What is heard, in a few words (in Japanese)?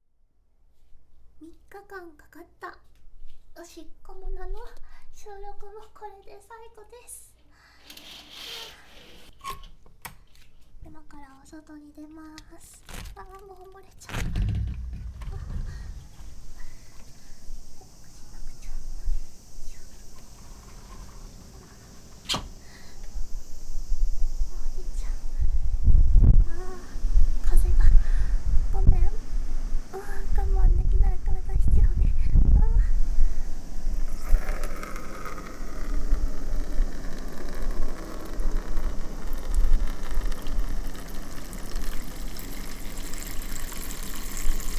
ベランダで洗面器のおしっこしちゃうの